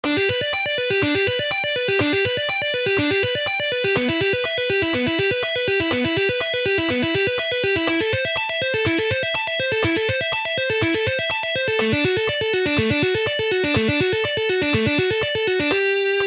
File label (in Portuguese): Exercício tapping